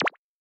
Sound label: Bubble Hit; boomerang fu